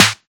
original snare A key, snare sound tuned to the A note